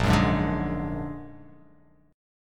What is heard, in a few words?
Bb Augmented 7th Bb down, chord; Bb+7; strummed; JGuitar